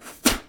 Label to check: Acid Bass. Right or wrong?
Wrong